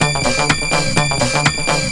MARS LOOP, Techno Trance; Music Loops

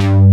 FUNKY LD; Pro-Rec - Synthsonic; BASSES

